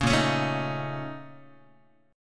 A sharp Minor Major 7th Double Flat 5th, Guitar; A#mM7bb5 strummed; JGuitar

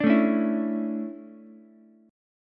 D Major 7th Suspended 2nd, Ukulele; DM7sus2; strummed; JGuitar

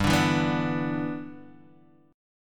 G 6th, Guitar, Standard; G6 strummed

